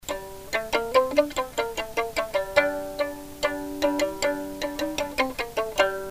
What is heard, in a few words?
col legno